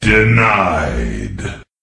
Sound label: denied